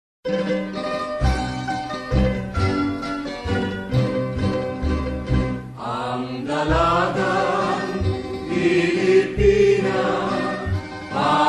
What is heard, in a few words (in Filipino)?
song